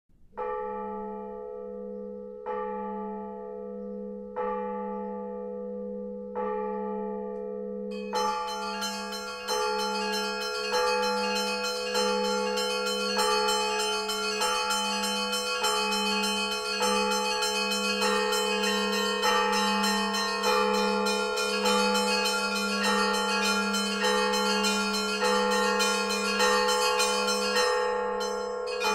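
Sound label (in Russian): перезвон звонницы ближних пещер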